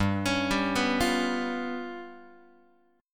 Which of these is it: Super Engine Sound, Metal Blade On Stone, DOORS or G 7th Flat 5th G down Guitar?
G 7th Flat 5th G down Guitar